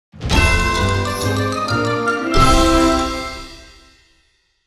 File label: Large Win